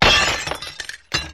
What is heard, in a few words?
glass shatter